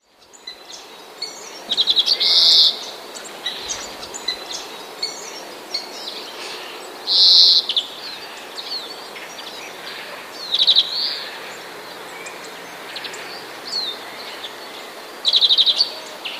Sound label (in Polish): dzwoniec, szczebiot i gwizdy prawdziwego dzwońca; Dzwońce szorstkimi gwizdami odzywają się w czasie godów.; wroblowe; zapozyczenia